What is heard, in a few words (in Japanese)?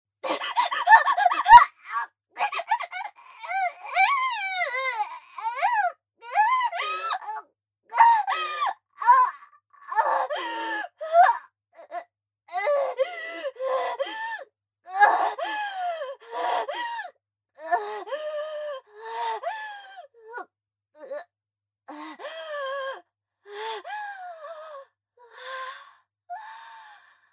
アナルファック射精後の荒い息遣い; A-16 ヘレナにアナルファック射精後の荒い息遣い; アスティリア